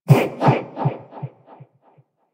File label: Breathing; Kikiki